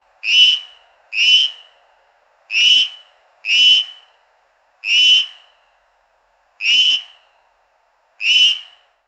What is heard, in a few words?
tungara frog calls